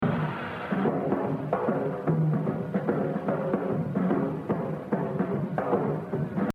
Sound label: Exercise, jazz drumming; Exercise 15 Gene Krupa - 82%; The Evolution of Jazz Drumming (Gottlieb)